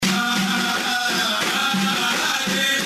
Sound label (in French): rythme musical